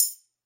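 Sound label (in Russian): одиночный короткий хлопок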